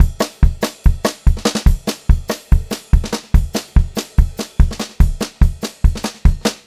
144 SPEED, Pop-Rock Drumloops; USB Soundscan vol.37; AKAI; 90 s Sample CDs

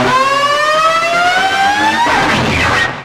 wild, special efx, guitar; East West; Steve Stevens Guitar Samples Collection

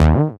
SYN DANCE; Sound & Vision - Gigapack II

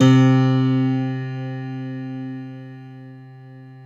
six pianos, Prepared Six; Optical Media International; Sonic Images Library

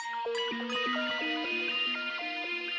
SYN, Hip Hop and R&B Drumloops; Best Service ProSamples vol.01; AKAI

